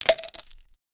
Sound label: equip chainmail; sfx arpg ui